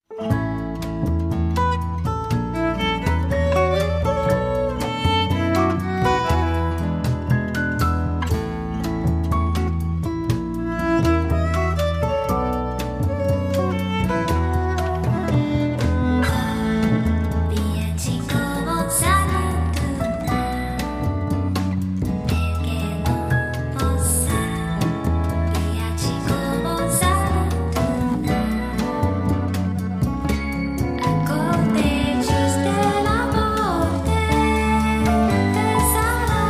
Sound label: acoustic